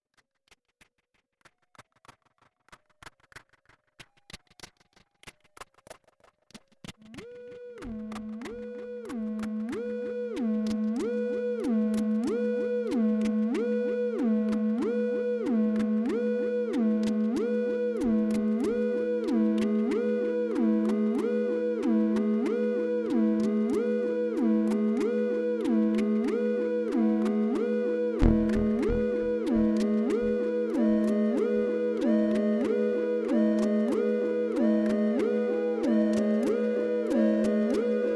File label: quadrature trill; Moe's sounds; Cyndustries